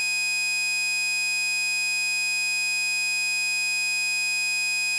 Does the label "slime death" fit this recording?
No